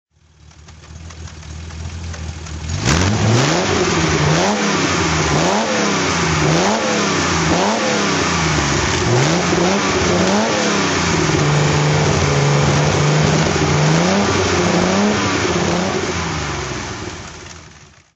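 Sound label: healey 1006 3weber throttle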